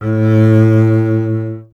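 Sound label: STR CBS, Solo Instruments, double bass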